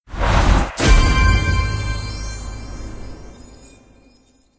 star evolution end

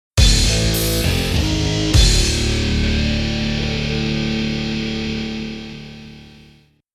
Game Over (Game Over); Spawn In the Demon's Hand

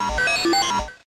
Glitch; pokemon reborn e19 2022

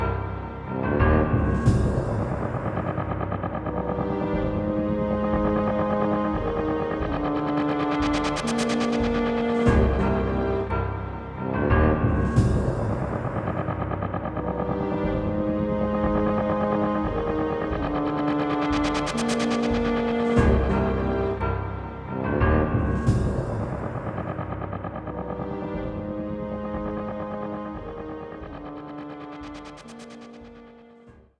Romulan Station Exploration

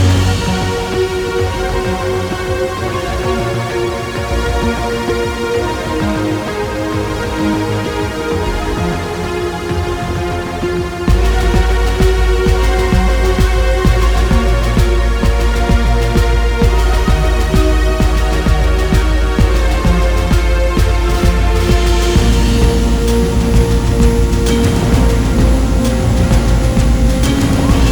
Mines Boss Loop; Tinertia

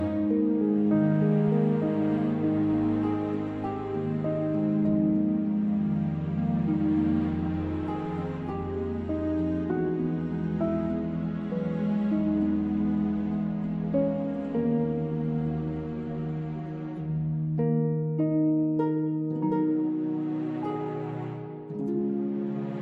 Quiet Menu Looped

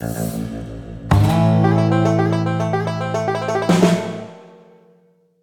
Music Stinger; Entrance Mood 2

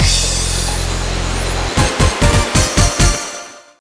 Game Clear; Arkanoid Live

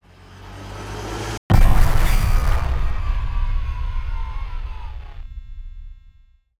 Stinger; Buff Install

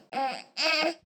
baby grunt

